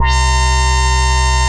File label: Tension Extreme, Synth Lead; Bass; Ableton; Creative Extensions